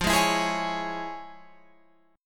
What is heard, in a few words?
F Minor Major 7th Sharp 5th Guitar Open F; strummed; JGuitar